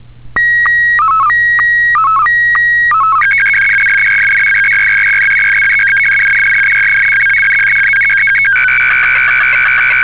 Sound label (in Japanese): SSTV, AVT90秒; 約９０秒; ｱﾐｶﾞAVT90秒